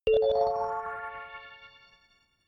notification kalimba magic

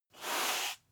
broom sweep slow single

